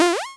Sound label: Jump